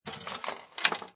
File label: Pinball Sound Ball Fed Into Launch Slot; Video Game; Games